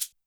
Shaker, YAMAHA RM1X

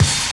bass drum, Drum & Bass, 160 BPM; Ueberschall; Drum & Bass Flash Trackz

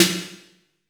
AMBIENT S8, WET AMBIENCE; Masterbits - Soniq Elements

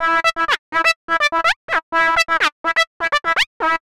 125 Fluty Blipps E, Keys, Nu Jazz House; PowerFX - Nu Jazz House

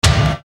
Bass Dr, EuroDance Bdrums

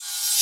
Reverse Cymbals

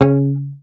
MUT C#3, Bass; HAMER12MUTES

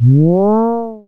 A#, FX; Big Fish Audio

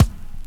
KIT, Dance Mega Drums; Best Service

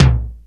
ROCK PERC.

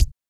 Vocal, Blues & Soul; ILIO; Vocal Planet VOL-2

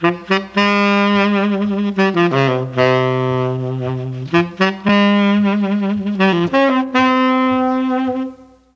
A#3 SAX, Mellotron; Mike Pinder - Mellotron Archive CD; FOXTROT+SAX

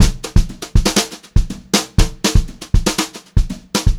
Brushed Drum Loops; USB Soundscan vol.39; AKAI; 90 s Sample CDs; 120 HOTROD

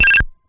tree, ATARI

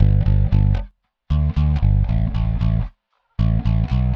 Bass, Indie Rock; Sonic Foundry (Sony Creative Software); Indie Rock Anthems; Session 06 - Haze

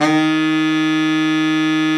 BARITON, Sax; Giga Samples Collection

